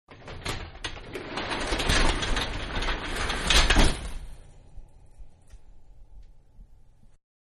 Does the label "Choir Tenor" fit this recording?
No